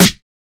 00s sizzle snare sound g key, steel snare drum sample tuned to the G note; snare drum samples